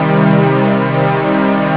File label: minor synth strings; jazz jackrabbit 1; M8